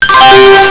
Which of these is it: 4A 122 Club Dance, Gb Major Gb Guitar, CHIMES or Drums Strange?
CHIMES